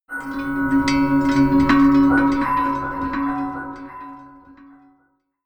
melodic odd pad bell high

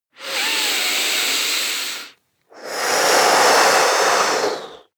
breath male deep blow

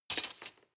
cutlery large drawer place

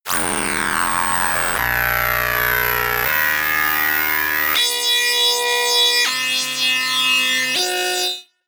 screaming synth sequence long

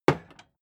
hammer wood hard

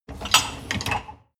dishwasher upper tray draw out short

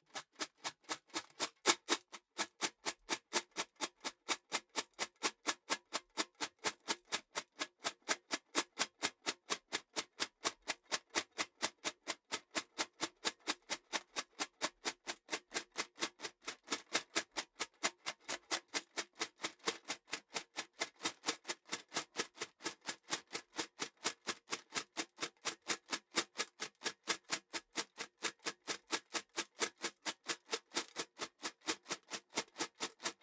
troops metal clatter run

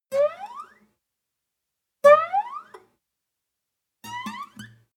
string chirp slide up

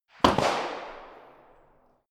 rifle single shots

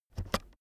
keyboard external space press fast soft

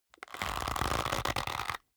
leather creak soft

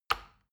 plastic button tiny push heavy

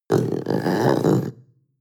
panther young grunt deep short

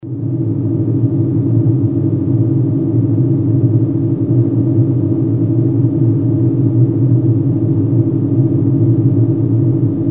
spaceship engine

